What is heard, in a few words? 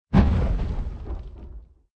hit burn; Viking Escape